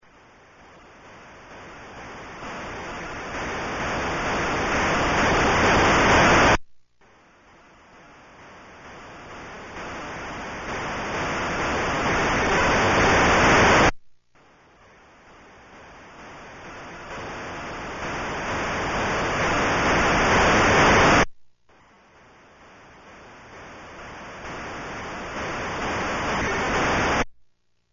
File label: Warp